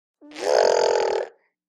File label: Baby Girl Animal Sound, nineteen month old